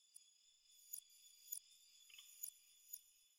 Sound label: eyeblossom idle; minecraft 1.21.5; block